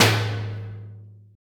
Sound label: BANG, Impacts, Hits & Booms; Distored Reality